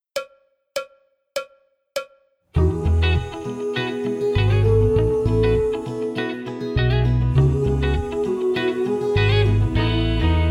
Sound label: backing track without click